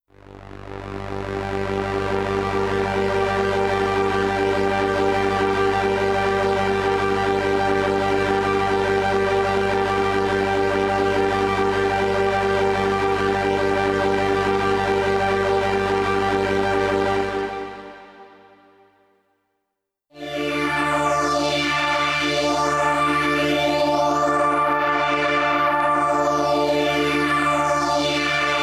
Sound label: streichfett